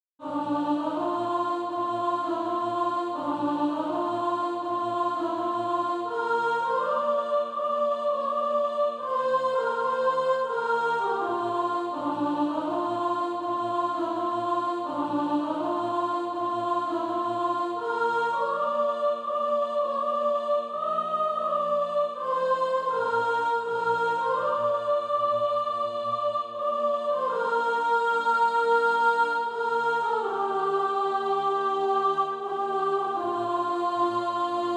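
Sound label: soprano, Practise Music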